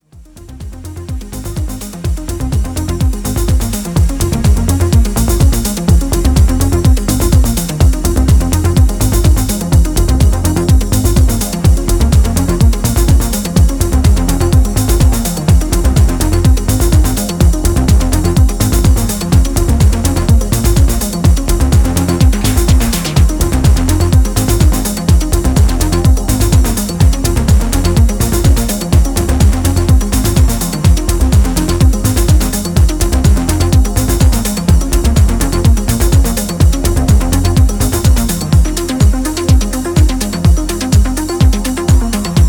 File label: Engine Chords